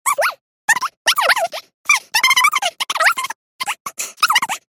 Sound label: clock